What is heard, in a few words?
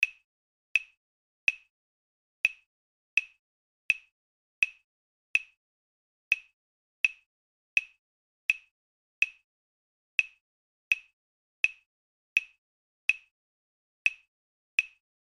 bossa nova clave